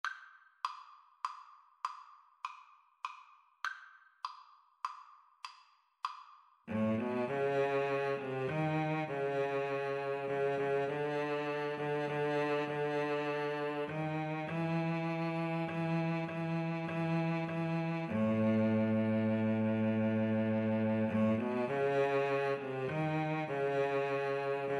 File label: Violin Cello Duet; rugged cross